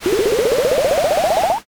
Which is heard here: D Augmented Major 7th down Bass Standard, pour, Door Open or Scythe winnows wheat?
pour